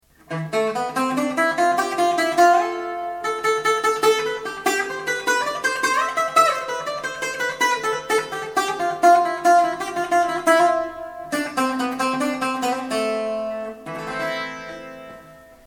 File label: Bouzouki